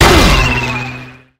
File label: shell hit